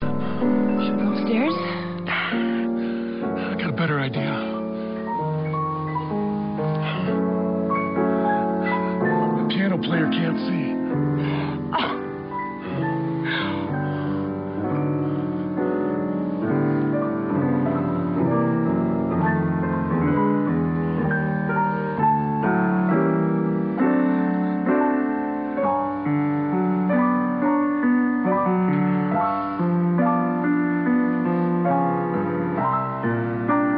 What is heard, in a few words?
piano